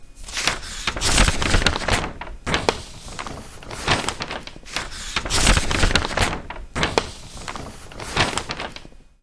sanomalehti

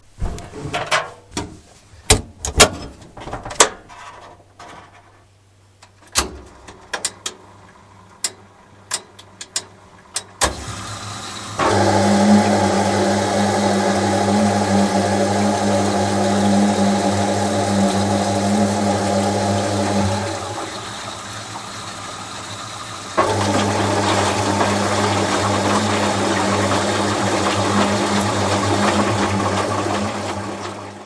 pesukone

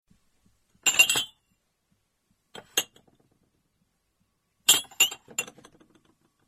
juomalasi

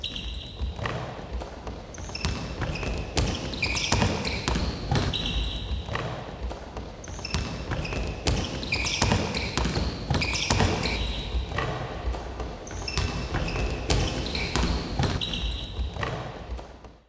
liikuntasali

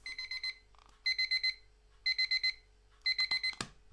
heratyskello

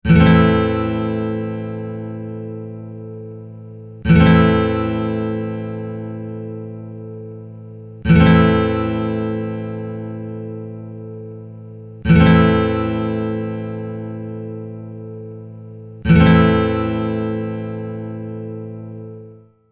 kitara

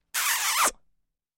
pusu

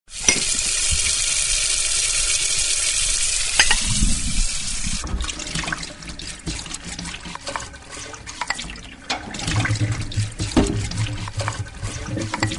tiskaaminen

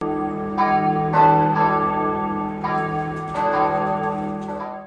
kirkonkello

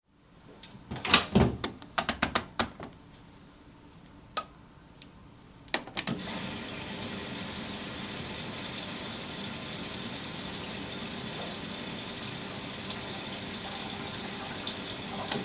pyykkikone

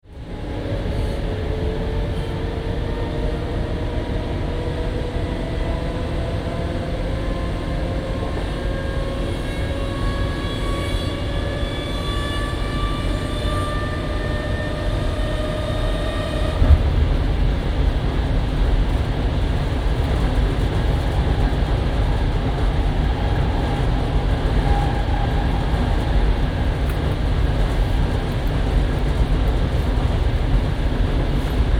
juna sisältä

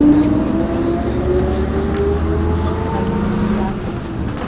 bussi

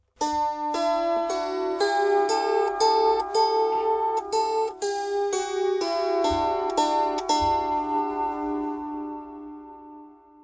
kantele

